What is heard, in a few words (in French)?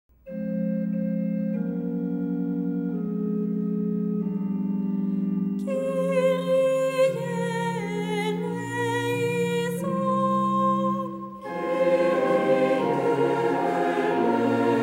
kyrie miséricorde chorale